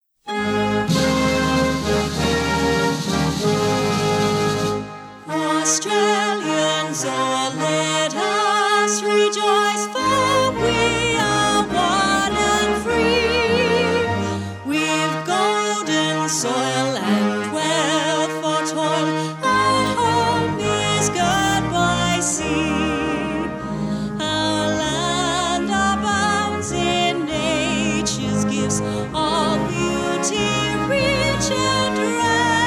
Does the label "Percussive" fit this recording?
No